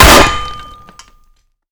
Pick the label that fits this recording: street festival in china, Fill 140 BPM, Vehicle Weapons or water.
Vehicle Weapons